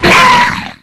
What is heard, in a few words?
watcher pain